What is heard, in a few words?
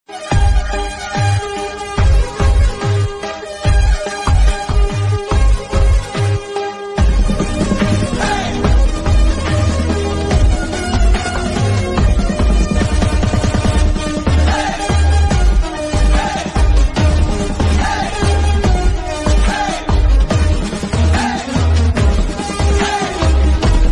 Bgm Ringtone